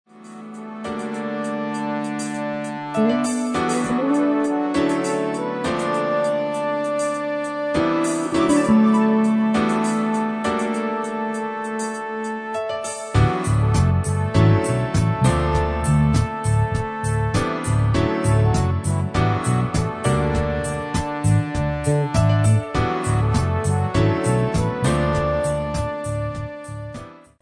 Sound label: smooth jazz cafe